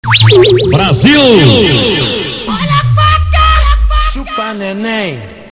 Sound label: knife; cstrike